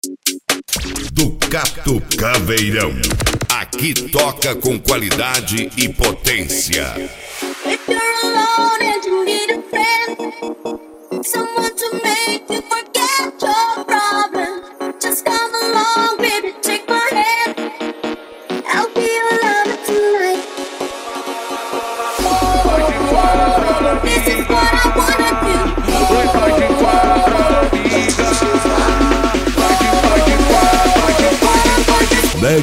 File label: especial mega funk